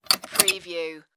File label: spring lock